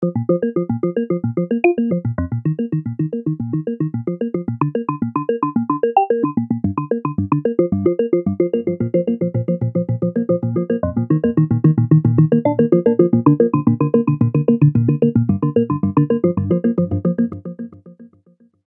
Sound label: Synth arp